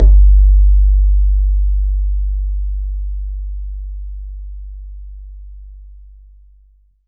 BAYAN, Drums Percussion

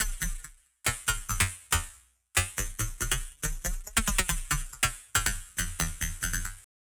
Structured Rhythm, IDM Rhythms

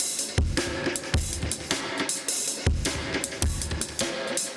Beat D 105, 105bpm; dub; musicradar; dub designer; Beats

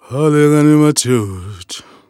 Vocal Chirp, African Voices

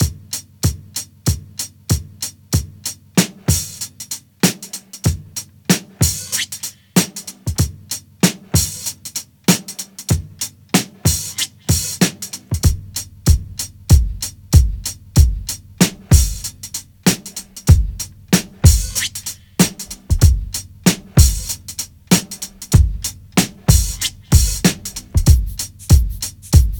95 Bouncy Library, Breaks; Lockdown Breaks

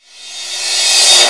CRASH, PERCUSSION, REVERSE

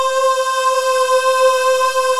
MALE CHOIR

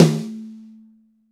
Snare, Roland TD-20

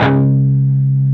PRS PWR D3F, Guitars; PRS PWR CUTS

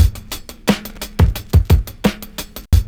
BEAT, Hip Hop

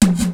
REVERSE DRUM; Rubber Chicken Software Sample Bank; DRUMS + PERC

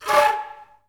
FL FX, Advanced Orchestra

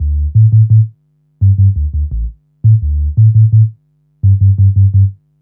Sub Bass, Drum & Bass; Fluid Dynamics

